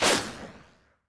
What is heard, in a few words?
Wpn silenced 22 pistol dist